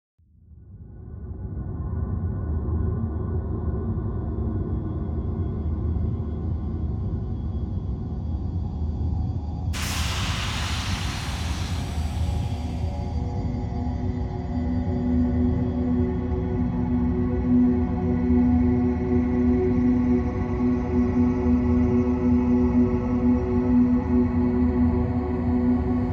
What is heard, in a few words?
ambient montage